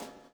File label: SLICK, Backbeat